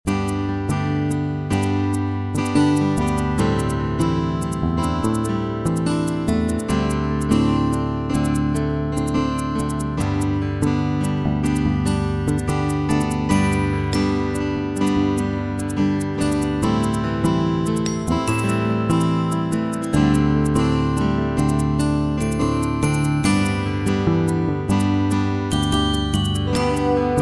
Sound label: folky